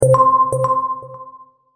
scan completed; Alwil Software; Avast5

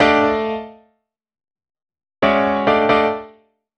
090 Acid Jazz Piano Fmaj, Grand Piano; Digidesign; Pro Tools Essential Loops

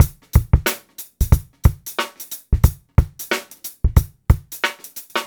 091 HipHop Live Drums; Digidesign; Pro Tools; Pro Tools Essential Loops

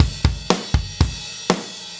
120 Solid Rock Drums, Fast Tempo; Pop Rock; Digidesign; Pro Tools; Pro Tools Essential Loops

